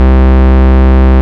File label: Ultimate Bass Root C; Vengeance Samples